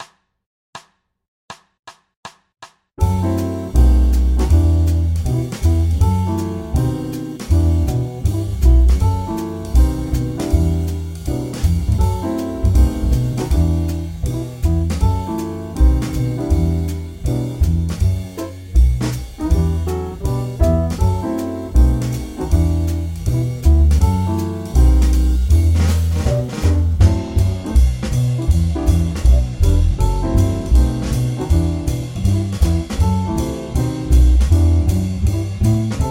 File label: F#m7b5-B7-Em7 loop; 2bars Loop